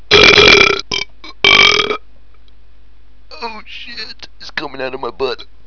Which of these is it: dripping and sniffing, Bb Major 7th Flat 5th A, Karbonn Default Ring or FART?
FART